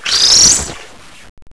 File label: fall; scourge